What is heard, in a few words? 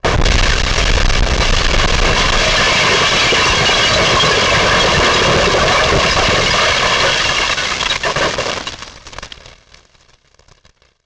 Title Transition Communication Distortion Jammer; Adobe; Production Element